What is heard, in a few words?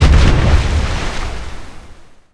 death explode; Smite; Sol